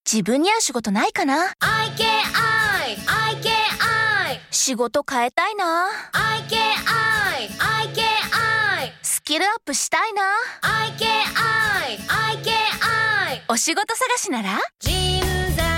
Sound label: sound logo